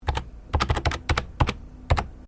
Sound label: Keyboard Computer Sequence Short, computer keyboard typing input; Electronics